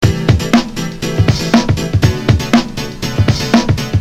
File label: depressing rock music